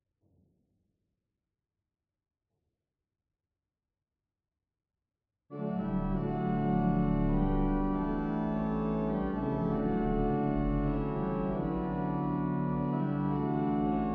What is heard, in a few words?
Organ